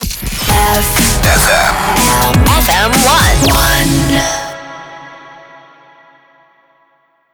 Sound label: Jingle 122bpm Cm D Rapid Fire; 87.5 FM1 Manila